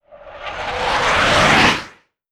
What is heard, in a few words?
f15jet rear; Call of Duty Modern Warfare